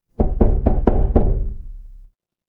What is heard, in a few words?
door knock